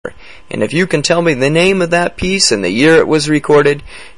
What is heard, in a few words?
Good Old Blues